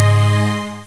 chring, Synths; Notes

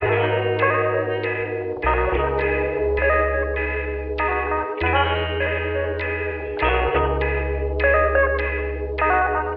guitar sad and sappy, Loops Phonky

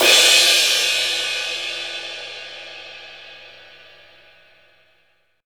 big decay, Cymbals Electro

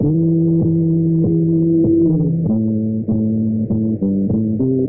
bass butcher funk, Loops, Phonky

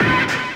ring

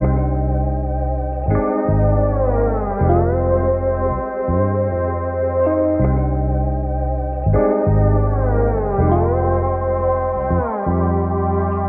keys, Sad

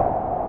breath

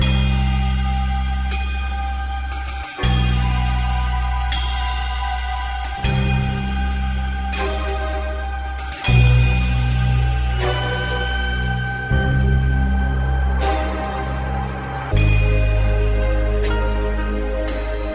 strings, Sad

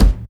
Kicks Loud

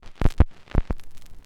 crackles, Vinyl

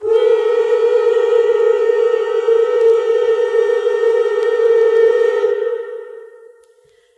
voice dissonant choir; Loops; Sad

